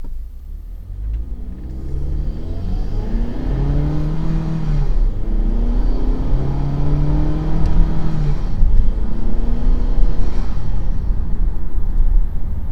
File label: 5k acceleration, mazda; forge motorsport bypass valve